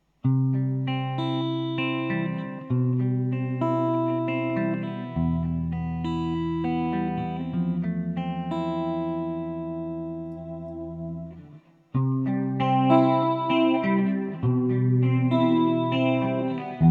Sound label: chorus